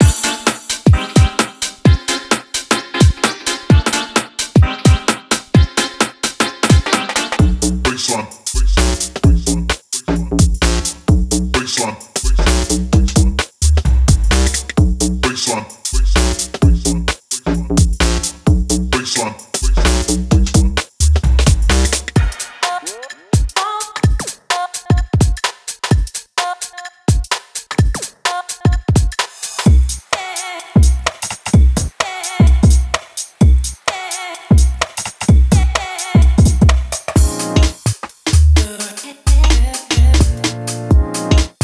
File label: 2 Step Garage